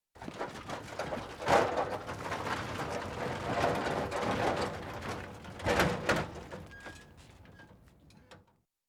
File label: Ladder Loaded Onto Fire Engine; Emergency; BBC Sound Effects Library